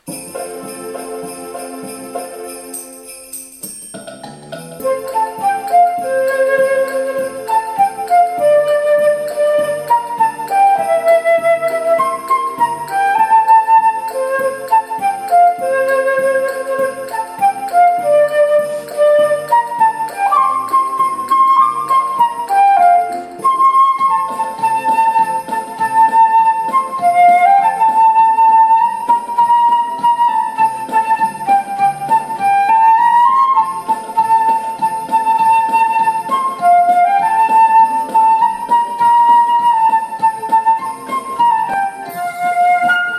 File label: Jingle Bells